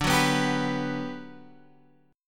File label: G Major 7th, D